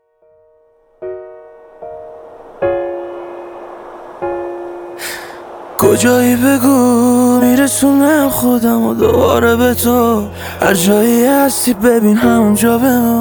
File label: Guitar Version